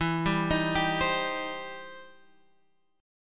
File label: E Minor Major 7th Sharp 5th E down; EmM7#5; arpeggiated; JGuitar